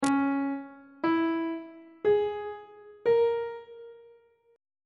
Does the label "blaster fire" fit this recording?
No